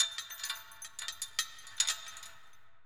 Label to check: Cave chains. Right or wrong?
Right